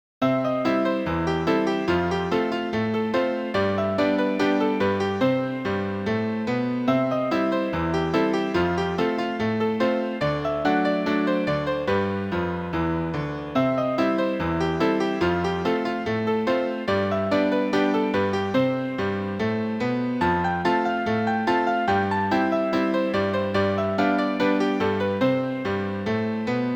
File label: Rag Solo